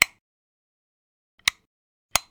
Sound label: switches; devices accessories utilities; NS Studios - ultra sound collection